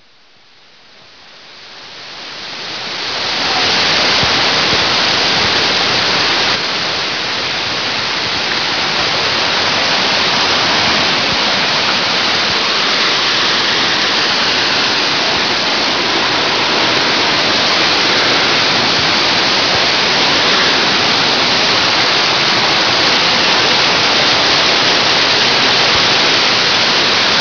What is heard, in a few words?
wtrfall